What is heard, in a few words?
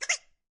quack